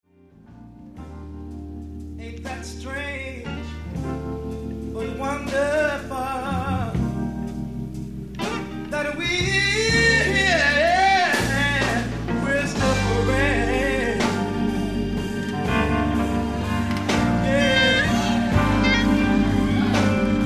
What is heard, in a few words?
Guitar Solo